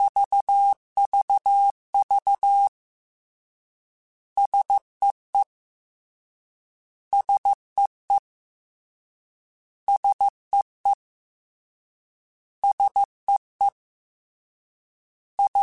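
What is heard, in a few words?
3 Letter Contrasts 13, Morse Code; CW Code Groups; Mixed; HamRadio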